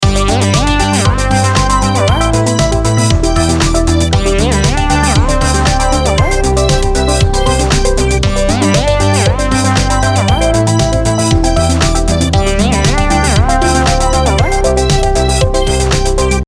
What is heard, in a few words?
Ringtone